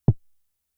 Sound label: Tom, Toms; Fricke Schlagzwerg; Drum Machines